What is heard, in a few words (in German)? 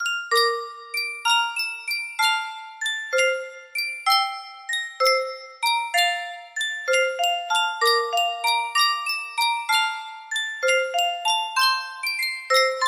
sankyo spieluhr